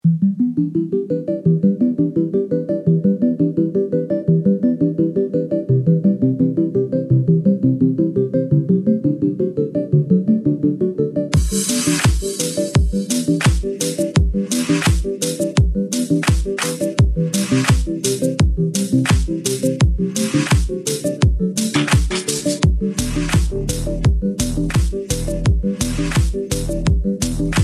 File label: Funky Beats